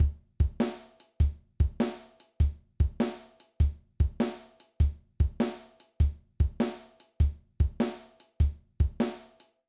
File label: Shuffle Groove, drum